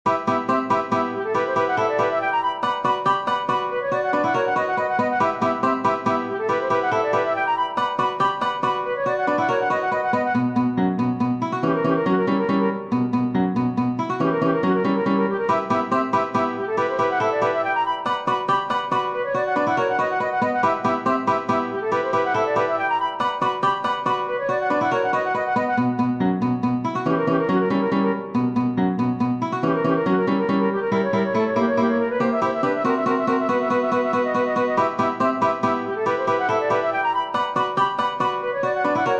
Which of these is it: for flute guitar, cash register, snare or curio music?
for flute guitar